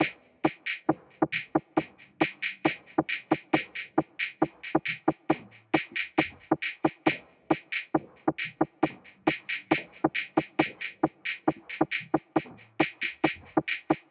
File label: Percussion Loop - 136 BPM, Hip Hop; Gone